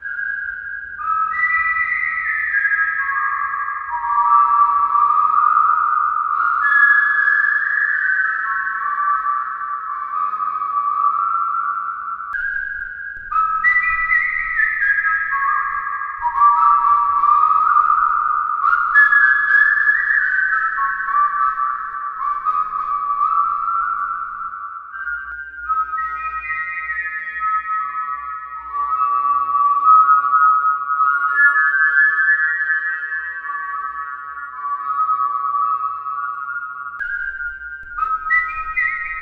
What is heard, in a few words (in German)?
Moodal Test Pfeifen Cathedral